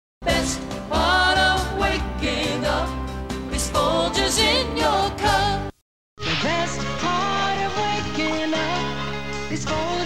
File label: JINGLE; Folgers; Speaking Human podcast; brand jingles